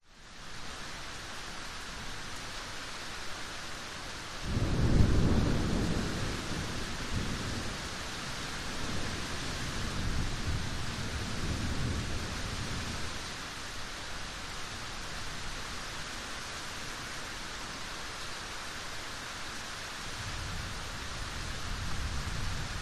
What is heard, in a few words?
nature thunderstorm distant rumbles and closer strikes med rain blustery wind; palm trees; zapsplat